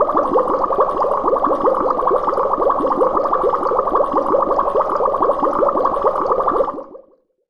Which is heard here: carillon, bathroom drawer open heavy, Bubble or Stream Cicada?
Bubble